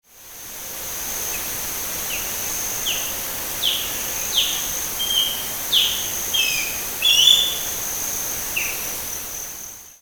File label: Western Osprey Pandion haliaetus; Sonidos de Pájaros